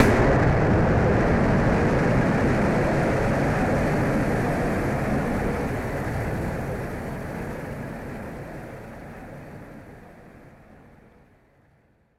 Noise Bomb; musicradar; bomb factory

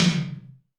Tom Md Return, Alesis D4

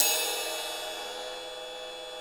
RIDE, Drum Dry; East Collexion; Drum 1 Dry

